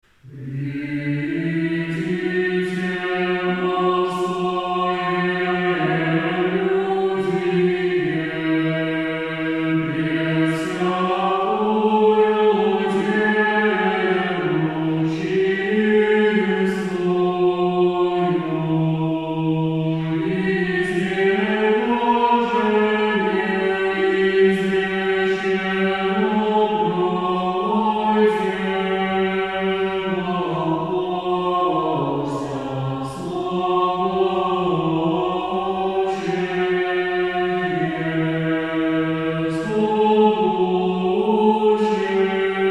Успение стихера на стиховне гл.4

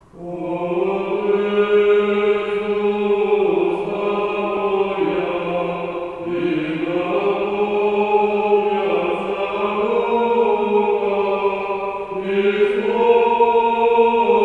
Введение. Ирмос 1 песни; Отверзу уста моя

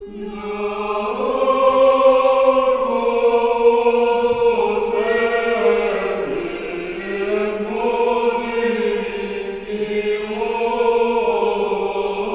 Введению ирмосы 4 глас 9 песнь

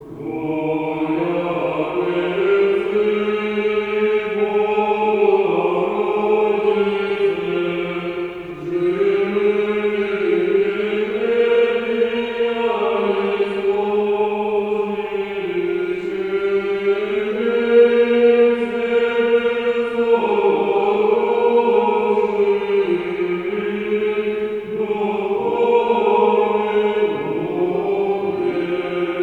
Введению и катавасия. Ирмос 3 песни; Твоя певцы

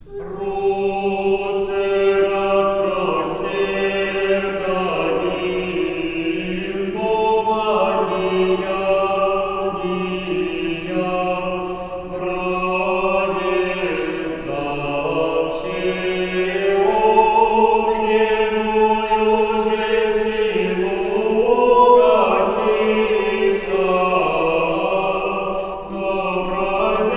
Ирмос 4 песни 4 глас

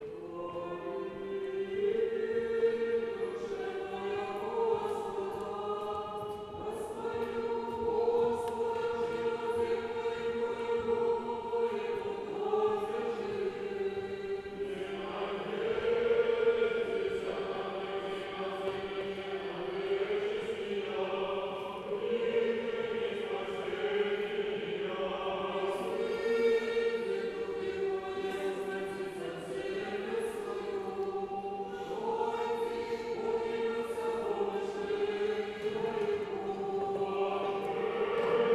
Рогожский хор 1 глас; Хвали душе моя

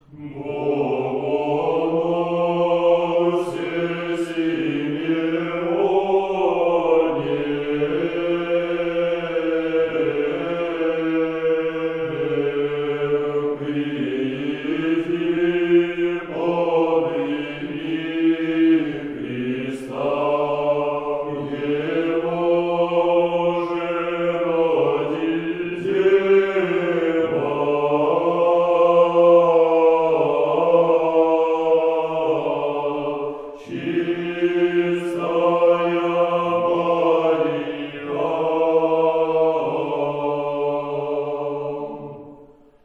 Сретение. 1 припев 9 песни; Богоносе Симеоне; Казань

